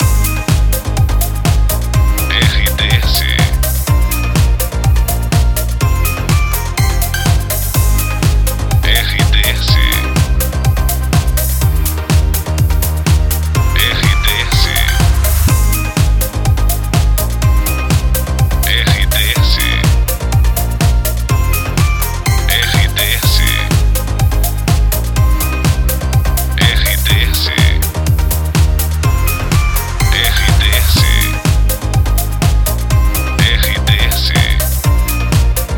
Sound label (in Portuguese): TRILHA PARA LOCUCAO; CLUBE FM PIRAPORA; Pacote Rádio Clube FM, Pirapora/MG. Emissora com perfil Jovem